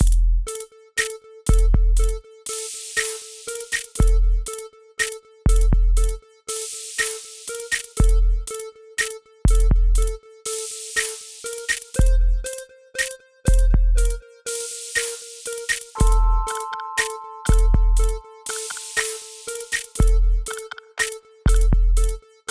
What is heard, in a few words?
TYPE BEAT